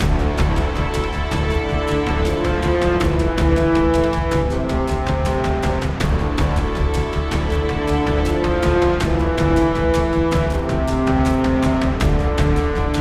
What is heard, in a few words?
Mood High